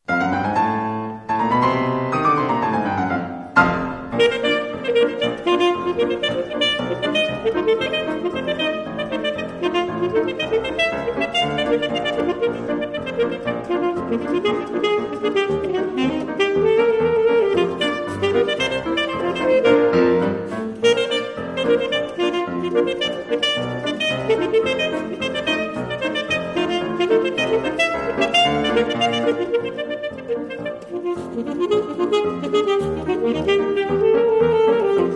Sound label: Ragtime